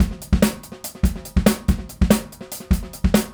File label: Straight Kit, World Pop Beats